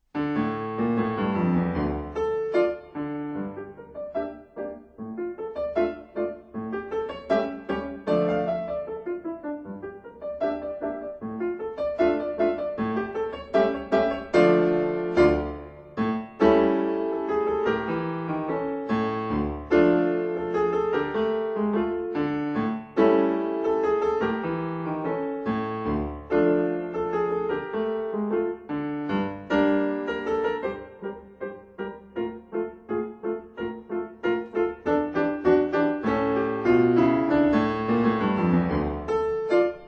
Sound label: Two Guitars